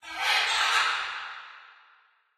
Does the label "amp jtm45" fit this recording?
No